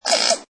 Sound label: Tui croak, Exotic bird - New Zealand Tui croaking. Rainforest bird sound.; Nature